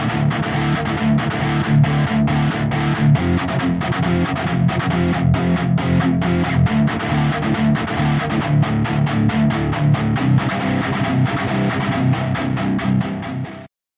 Phaser Bouncing Shape Guitar Dry; Chromacycle; Syntheway

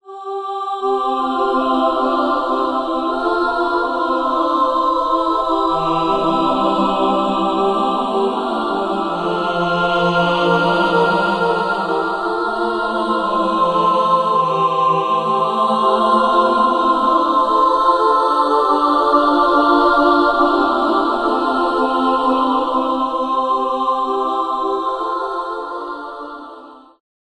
Celestial Choir; Magnus Choir; Syntheway

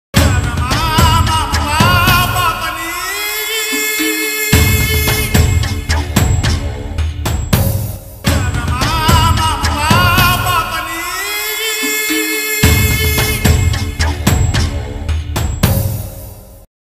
dramatic tone

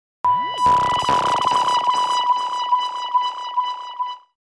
dramatic entry

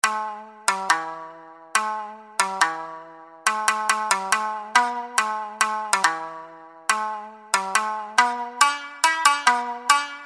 Traditional Japanese Folk Shamisen; ShamiKoto; Syntheway

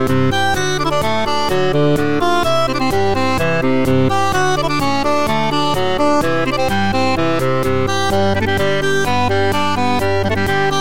Digital Concertina; Harmodion

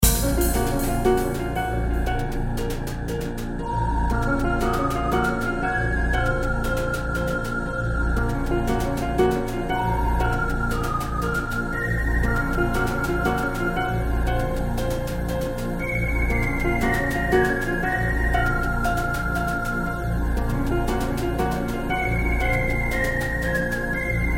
Piano; Syntheway; Realistic Virtual Piano

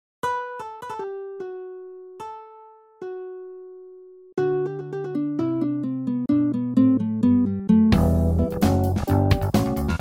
Picked Bass, Rock Organ, Rhodes; VST; Bassesland; Organux; ElectriKeys; Syntheway

